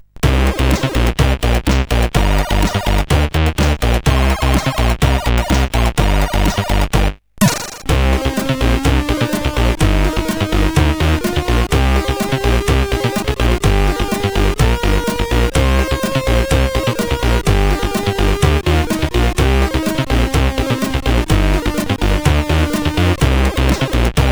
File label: Funk Extend, CSG8580R5